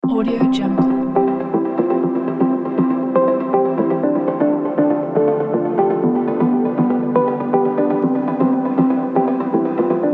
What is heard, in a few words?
tech uplifting kit